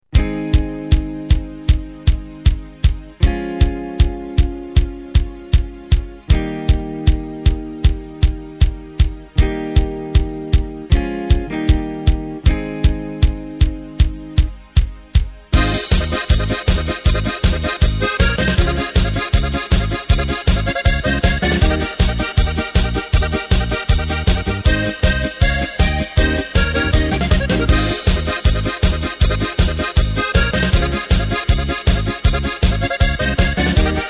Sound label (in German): Drums Playback, midifile